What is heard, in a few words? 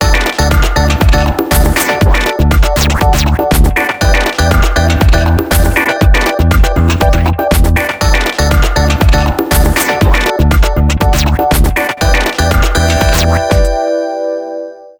ringtones; Informer; HTC One M9